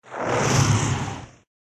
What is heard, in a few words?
Dark Spirit